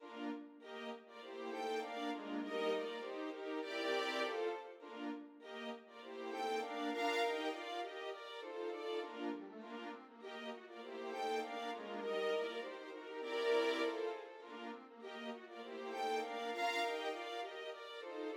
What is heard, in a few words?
Overture orch